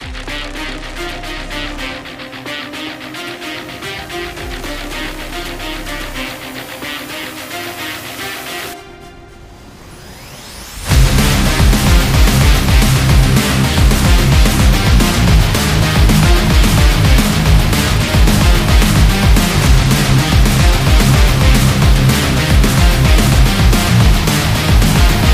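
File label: Action Promo, high-energy background music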